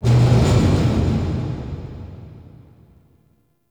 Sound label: Zariman Metal Rumbles; Warframe; The New War